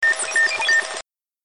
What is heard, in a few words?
computer